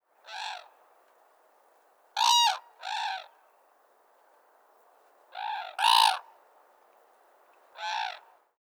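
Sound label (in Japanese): tanchou call, red-crowned crane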